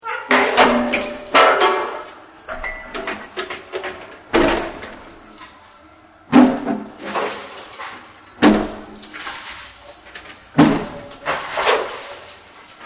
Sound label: Creaky Squeeky Chaos; Creaking; Sound Effects:Objects; Partners In Rhyme